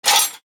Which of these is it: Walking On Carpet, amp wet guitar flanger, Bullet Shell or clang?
clang